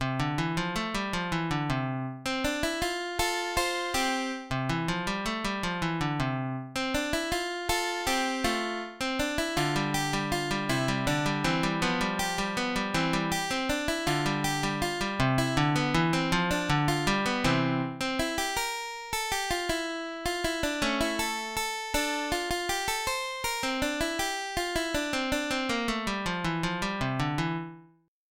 allegretto, guitar; diverse posizioni

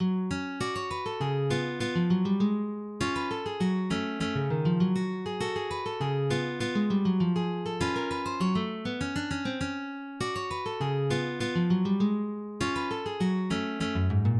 tango, guitar; Bauer

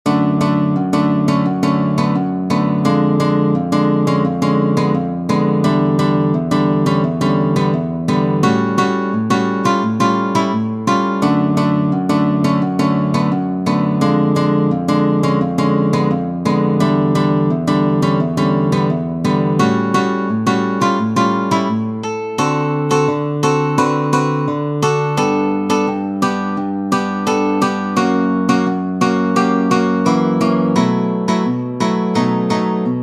Bossa, guitar

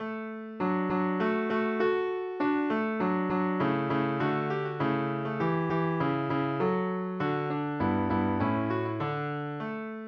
guitar; gayety

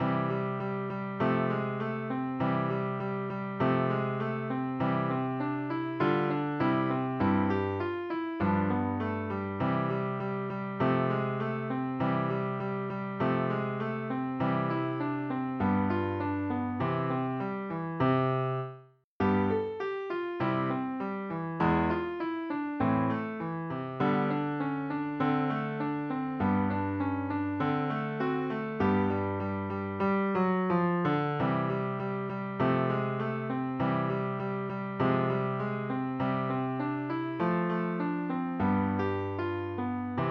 guitar; contentment

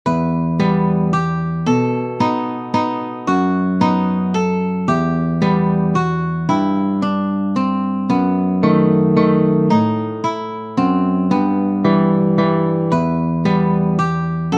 mazurka, guitar; Attilio Bernardini; Celia

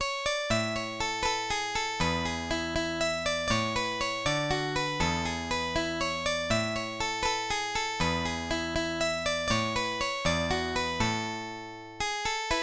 allegretto, guitar; nona posizione

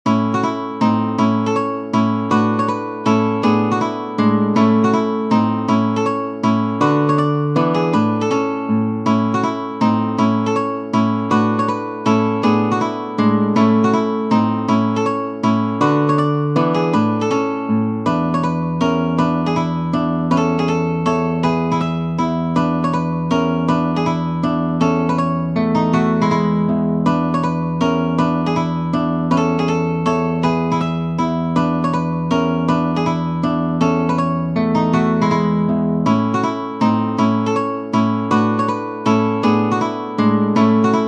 mazurka, guitar

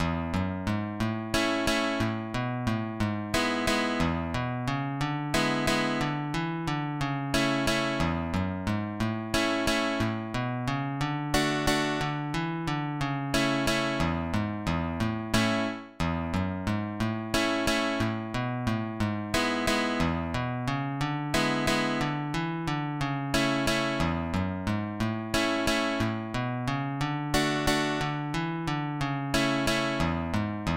Mazurka

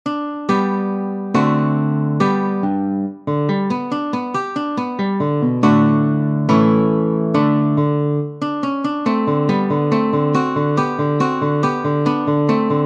Allegro, guitar

